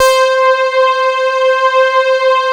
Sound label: OB LOW P